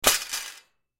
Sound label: Stand Drop Metal Leg Thin Rods; Drops; Foley; Objects; Blastwave